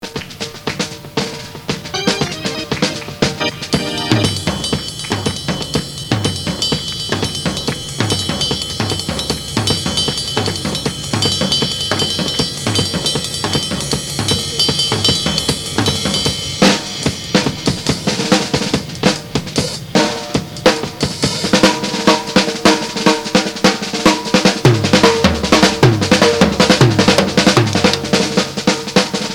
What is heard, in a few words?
Garden Gig Drum Solo